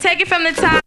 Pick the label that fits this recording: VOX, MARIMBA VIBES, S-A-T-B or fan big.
VOX